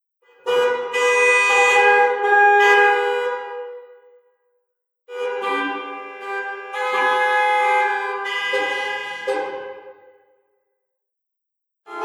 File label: Cities nyc Car horns; Google Earth VR